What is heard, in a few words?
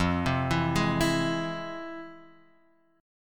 F Minor Major 7th, chord; FmM7 Chord {1 3 2 1 X 0} arpeggiated